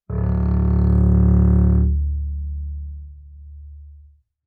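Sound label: Bass arco fortissimo sul C D1, Double Bass; MIS Pitches - 2014; Strings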